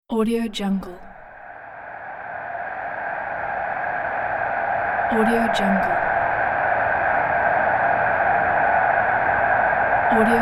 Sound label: sports crowd background